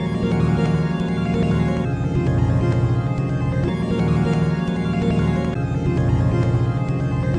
130 underwater warble synth; melodic loops

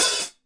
shut down ohh, hats; DRUMS (SINGLE HITS)

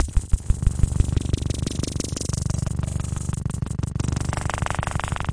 180 sample waves, drum loops and breaks; 161 to 180 bpm

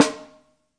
real snare; A Real Freakin Drum Kit

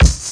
Double KIK, Dirty Hip Hop Kit; style kits

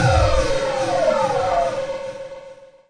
Electro FX; Ueb HE Electro FX

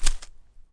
Plastic Bag Small Filled, Found Percussion Hits; UNUSUAL KITS and FX; ModeAudio

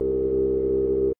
filtery synth bass; SYNTH KEYS ETCETERA

